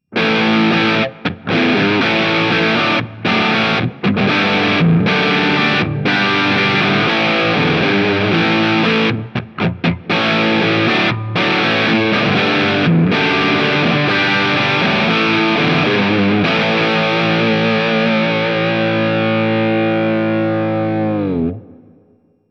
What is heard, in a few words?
Basic Rhythm; Small Session Board